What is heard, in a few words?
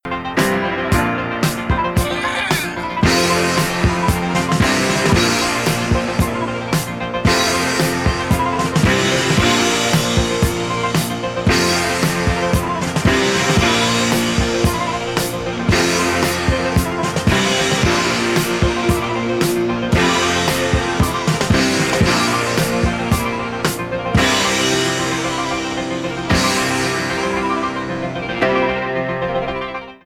Instrumental; Demo